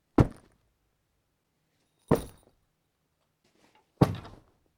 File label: Bags Dropped On Thick Carpet Backpack Duffel And Tennis Bag